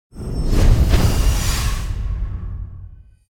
Stinger Impact Into Whoosh Heavy Trailer Beginning; Hollywood Edge; sound designer's toolkit v2